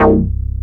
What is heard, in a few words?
SQ80 MONKEY, Synthesizers; Soli-Music; World of Synthesizers VOL-1